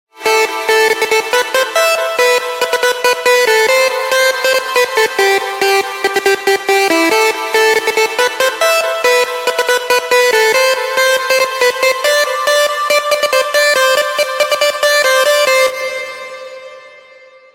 iphone dance party ringtone

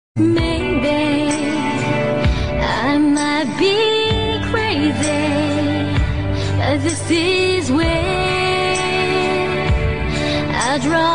ringtone

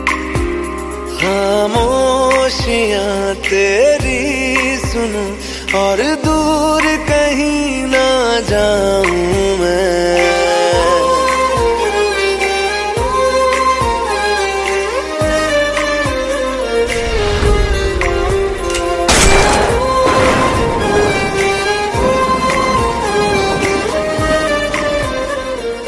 music ringtone, bollywood ringtones

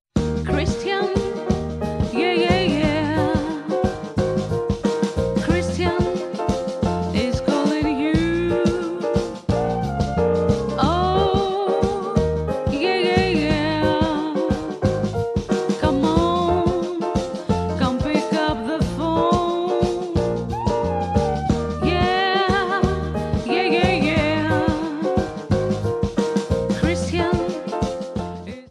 christian is calling ragtime ringtone

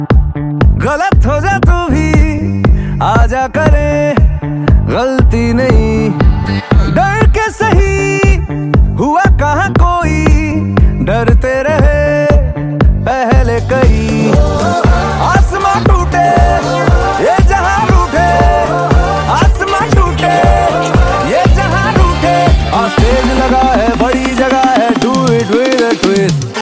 hindi ringtone, bollywood ringtones

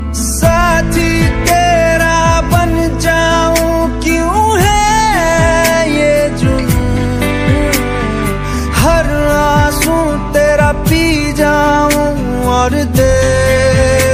bollywood sad ringtone

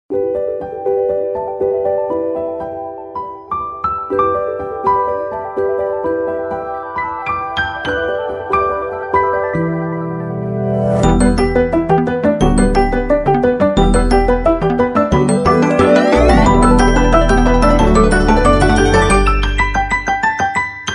lovely piano background music tone ringtone

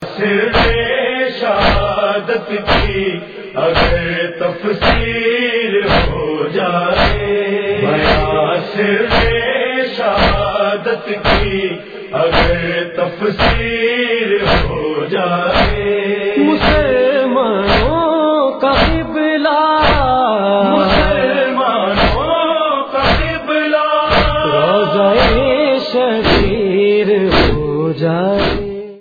musalmano ringtone

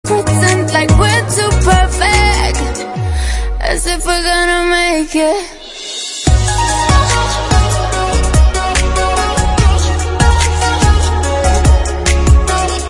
english remix ringtone

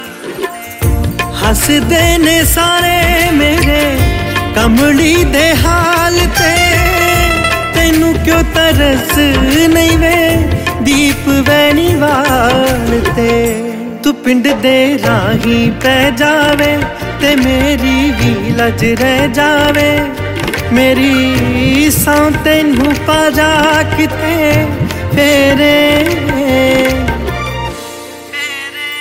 ringtone , bollywood ringtone

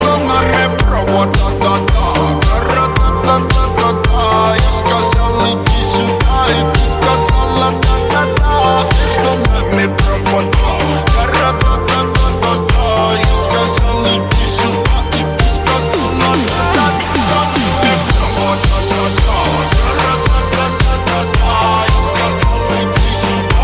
dance music ringtone

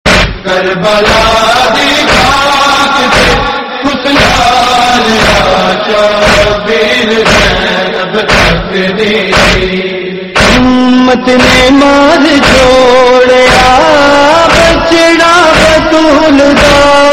noha tone

